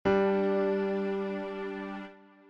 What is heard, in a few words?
G and D chord